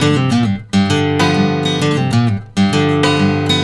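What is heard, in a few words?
baritone riff, Blues Guitar; ILIO; Fretworks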